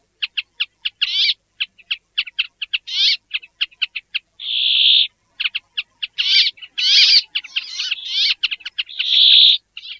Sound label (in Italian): PEPPOLE